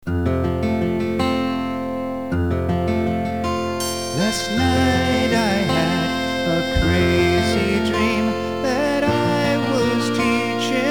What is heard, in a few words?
crazy dream vocal